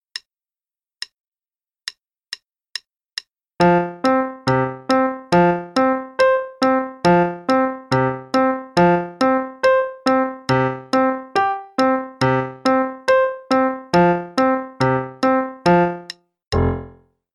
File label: Guide Note Pinball (qn=140); Faber Adult Accerlated; Adult Accel Level 1